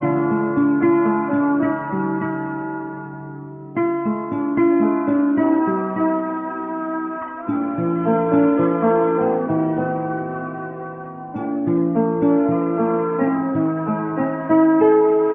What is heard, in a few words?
guitar, Loops, Sad